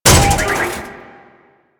Loot Locker Open; Objects; DE; Warframe; Storage Container

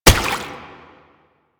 Step Water; Warframe; Proto Infested Brachiolyst

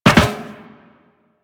Land Soft Metal Perforated, Footsteps; Warframe; Operator

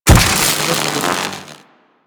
Electro Prod Hit Flesh; Warframe; Weapons

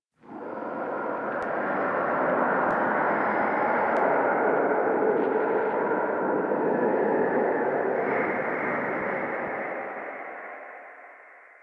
Whispers Dark; Warframe; Ambience; Entrati

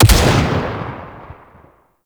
dropship turret shot; Grineer; Warframe; Tau

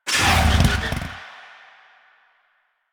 Power Slide Stop, Motorcycle; Motorcycle Ninety Nine; Bullet Jump

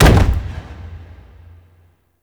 Infested Golem Step Default; Warframe

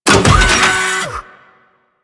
Thumper Bot Leg Up A; Warframe; Grineer; Legs Up

